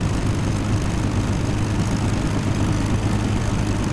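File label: electronic plane propeller spin loop; anemoi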